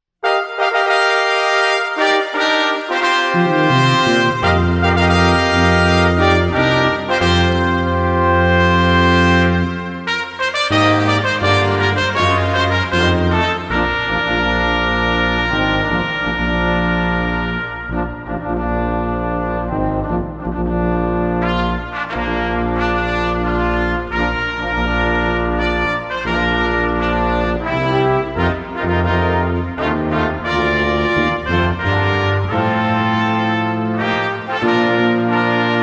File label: Star Spangled Banner Brass Quintet